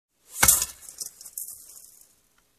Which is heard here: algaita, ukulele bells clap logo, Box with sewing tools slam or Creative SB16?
Box with sewing tools slam